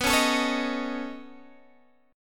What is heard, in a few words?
C sharp 7th Suspended 2nd Sharp 5th B